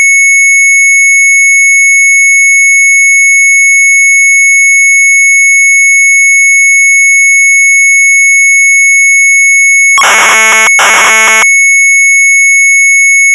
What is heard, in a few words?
Sharp MZ800 Tape; VIDEOBESTAND; retromelsarchive